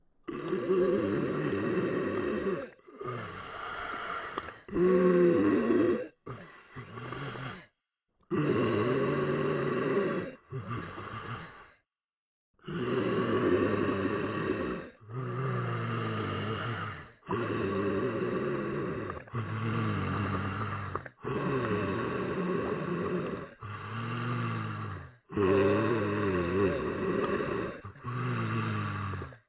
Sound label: breathing